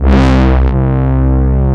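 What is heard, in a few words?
MEMOOG BRASS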